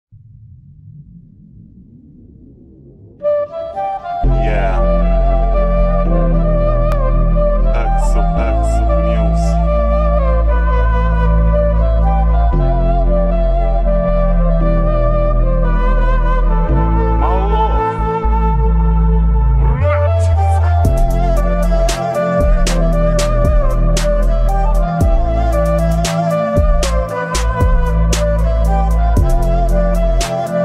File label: slowed reverb bass boosted